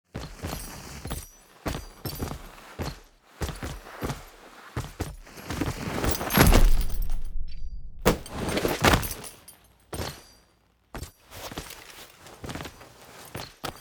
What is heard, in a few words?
Foley Steps Fall; Dax; Drifter; Warframe; Cinematics; DuviriQuest; Courtyard